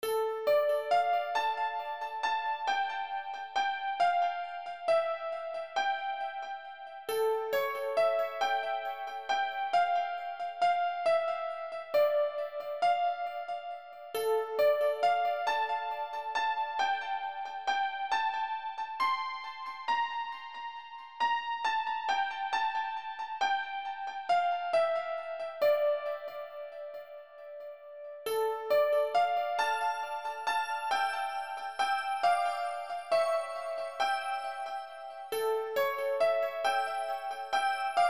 melody; Sailor Moon

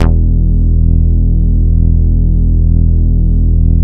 Bass Long E; VEC1; Club; Long Basses

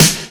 Snare; VEC1; Club

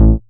Bass A, Offbeat Bass; VEC1; Club

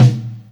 Tom, Hip-Hop, Drum Kit

